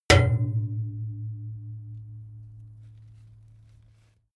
metal impact small hard long; hits explosionen; metall

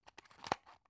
drug open; arzt

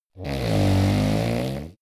monster snore short low

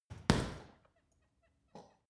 boxing punching bag side hook double hard; sport-freizeit; boxen

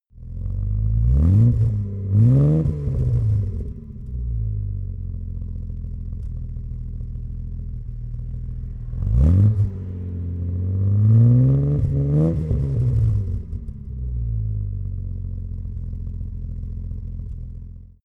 car jaguar e-type startup blips exhaust

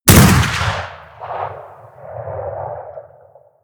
rifle remington rolling block crisp stone; schusswaffen

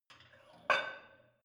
barbell light plate put on hit soft

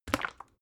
bottle syrup upend glug; berufe; gastronomie

